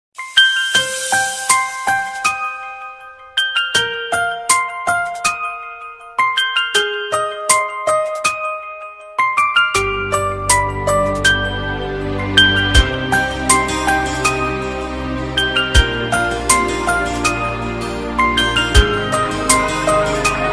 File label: arabic famous ringtone